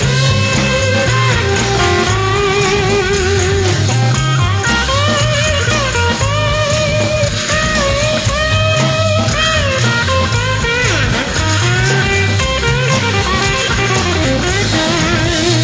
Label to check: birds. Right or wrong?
Wrong